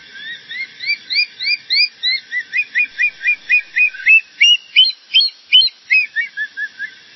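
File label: cry of a hawk circling over its prey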